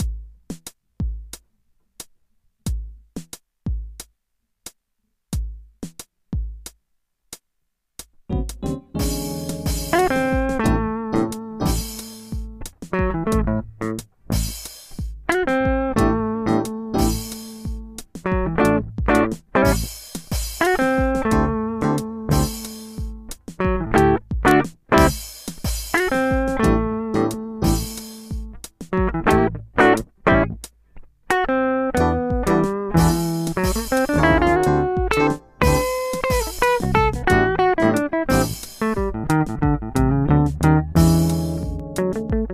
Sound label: funky blues